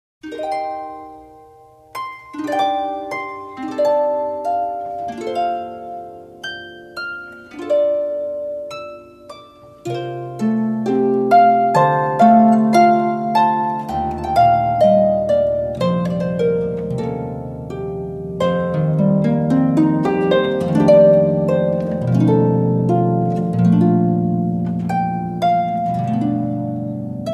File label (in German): Harfen